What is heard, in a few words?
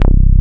BASS, Drum & Bass, 160 BPM